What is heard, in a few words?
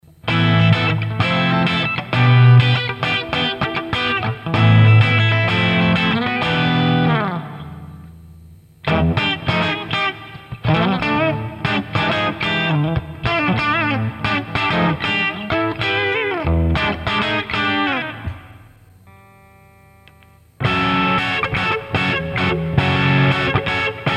clean gain max chords; JFET